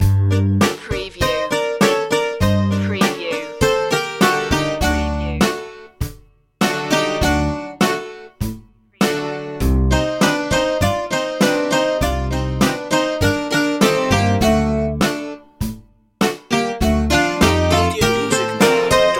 greek summer loop

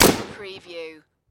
Machine Gun Bren

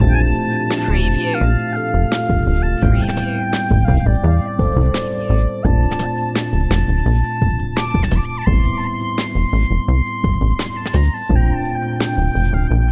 trap loop

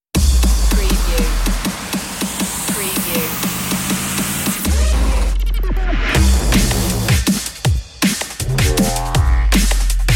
moving up underscore

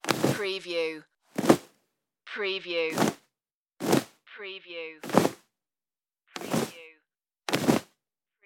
drop towel

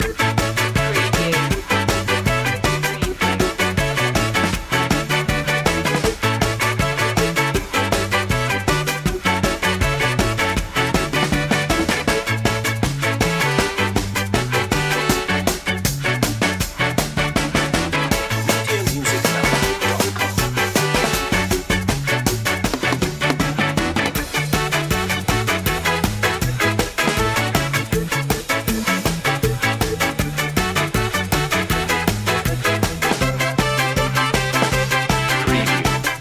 happy ska

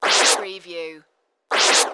DJ Scratch Effect

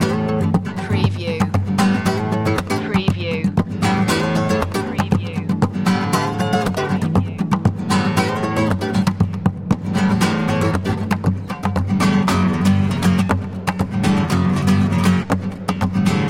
flamenco party loop

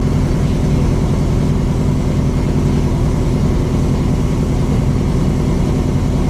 Rally car tickover loop; beanstalk audio

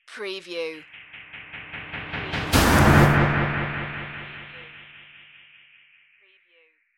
hammering whoosh impact

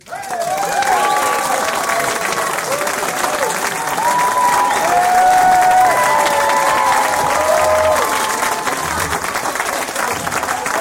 Audience Applause; beanstalk audio

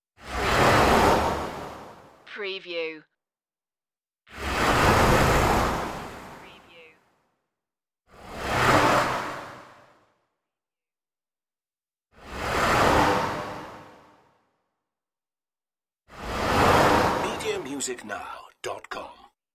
windy space flybys

